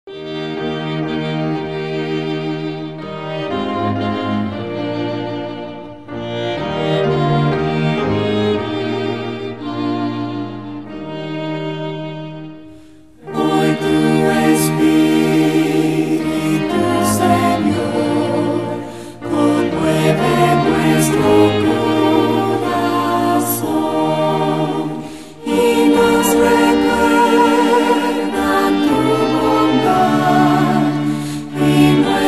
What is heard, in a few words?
vocal and instrumental; hymns spanish